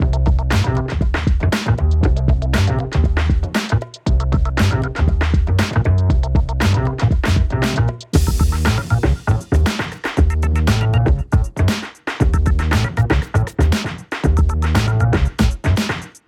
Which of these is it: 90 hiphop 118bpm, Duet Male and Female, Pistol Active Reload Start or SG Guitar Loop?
90 hiphop 118bpm